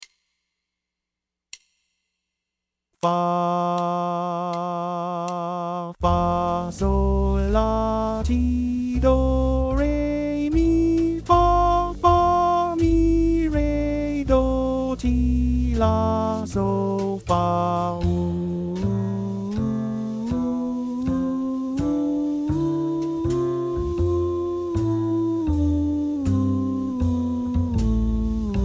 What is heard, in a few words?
Lydian 80 F